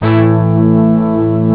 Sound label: horn 5ths; sonix; Rise Of The Dragon; chipmusic